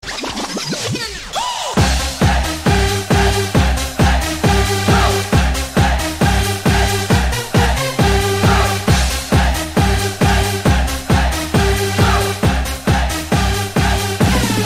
disco

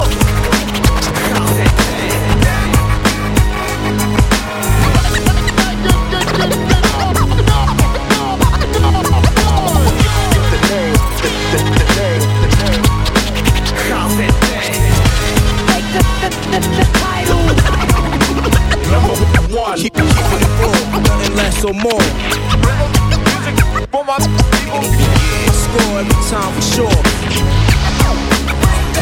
Intro Beat

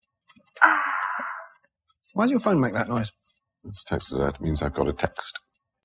New Alert; Sherlocks

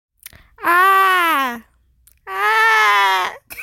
Ahhh

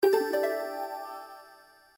Server msg charm

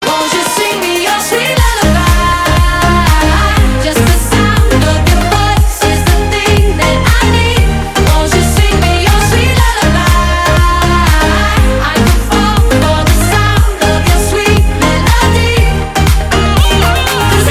Lullaby

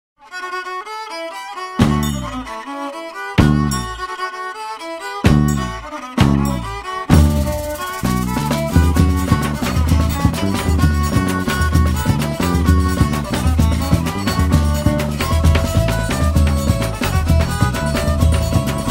Zabumba

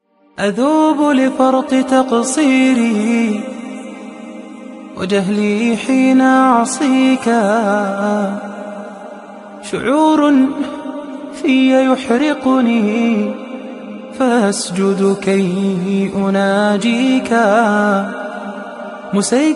nasheed

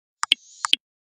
Nokia Alert

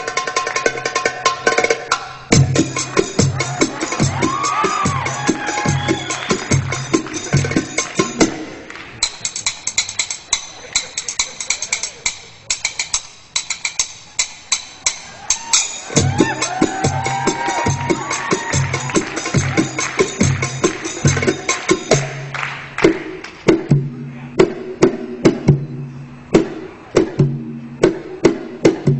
Belly Dance Tone

